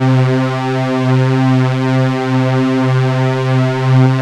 BIG FAT C3, FAT SYNTH; Zero-G Ambient 1

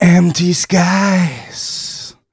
130 mel g, Vocals

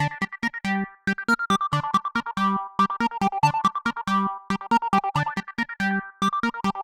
Arp 140bpm G, Trance; Zero-G Trance Inducer; Arps 140bpm; 90 s Sample CDs

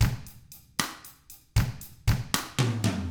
Drums, R N B 6-8; ILIO; Double Platinum Drums 1

